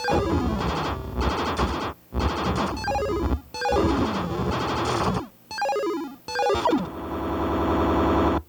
General gameplay; bwiddow

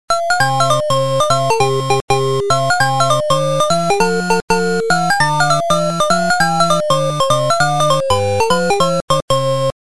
High Score Music, arcade; Dig Dug

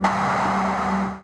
Crash; Turbo

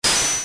Glass Break; FIFjr

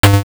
Bump; FIFjr

